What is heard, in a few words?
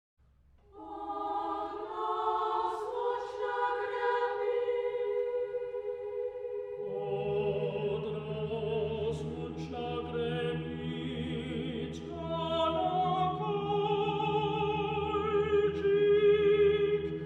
Kolokoltschik